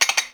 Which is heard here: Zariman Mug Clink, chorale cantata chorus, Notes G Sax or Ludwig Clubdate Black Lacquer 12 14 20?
Zariman Mug Clink